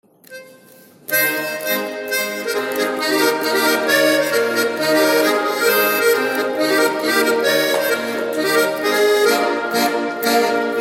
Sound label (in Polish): polka lubelska; lazurki; czemus jasienku mily